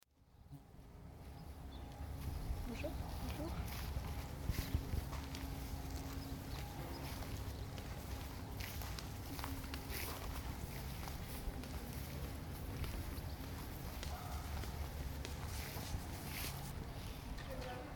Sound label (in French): parcours interieur residence; Intimite